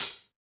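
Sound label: Hi-Hat Natural Open; Yamaha DJX-2B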